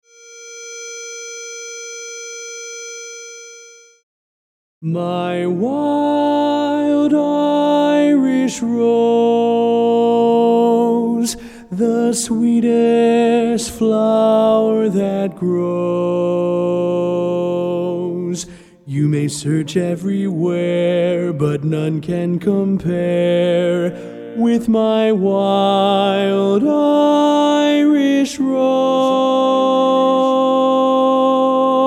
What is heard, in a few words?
Lead